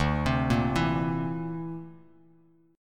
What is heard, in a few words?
D 7th Suspended 2nd Sharp 5th D, Bass Standard; D7sus2#5 arpeggiated; JGuitar